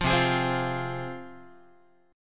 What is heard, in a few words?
C Suspended 4th Sharp 5th C Guitar; strummed; 8,8,11,10,9,x; JGuitar